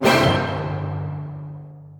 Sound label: Player Turn Stinger; Mario + Rabbids Kingdom Battle